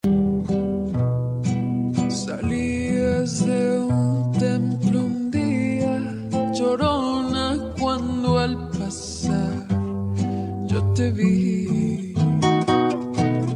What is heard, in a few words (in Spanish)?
Voz en Em